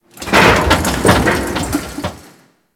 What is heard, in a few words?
Shipyards Conveyor Cart; Ambience; Props; Warframe; Grineer Shipyards